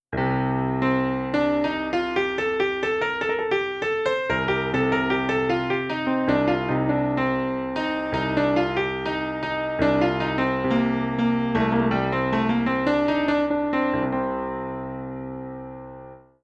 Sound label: Short Improvisation in C Dorian